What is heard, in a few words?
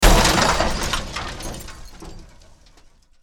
Auto Crash Crush